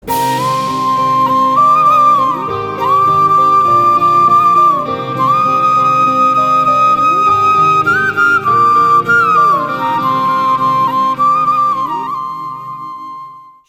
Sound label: Instrumental